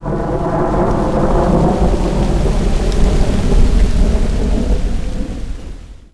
Thunder; ImpGal2; groundwar

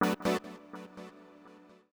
synth house 125 A; eJay; Keys

